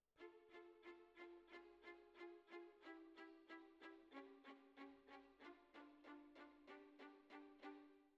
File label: Violas Over